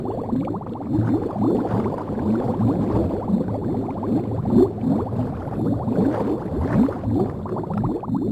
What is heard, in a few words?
sound Marsh Swimming Almost Out Of Breath